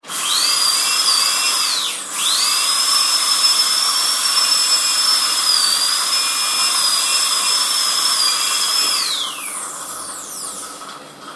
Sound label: dentist drill